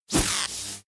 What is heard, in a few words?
battle defender attack; hideout